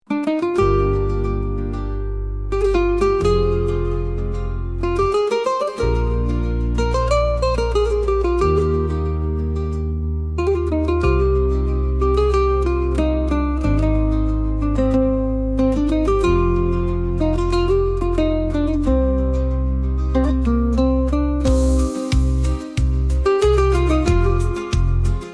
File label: midnight mirage, arabskaya